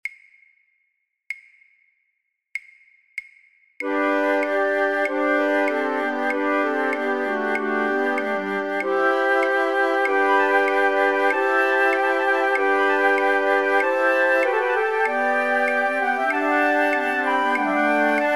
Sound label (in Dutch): Musette flute choir alle partijen samen; voor-oefentracks; Rameau; Platee